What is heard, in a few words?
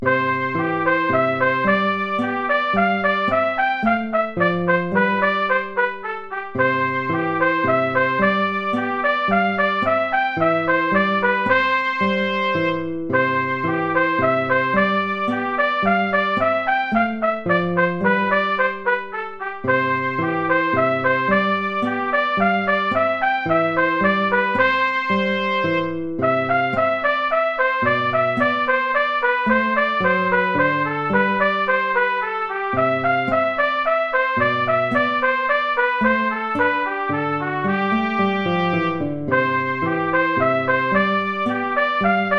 tempo minuetto; hook james